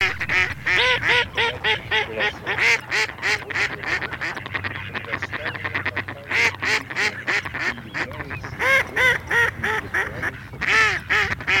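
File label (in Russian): Кряква Germano, манок; plurifon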